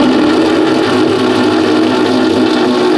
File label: metal box scrape rough loop; hl2